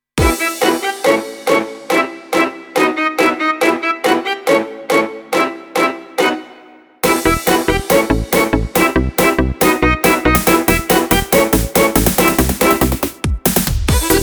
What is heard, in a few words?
Folk